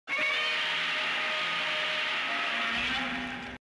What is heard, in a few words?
Roar; Godzilla The Series